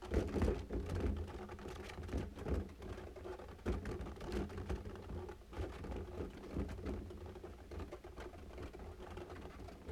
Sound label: Balloons; Fairlight Sound Lib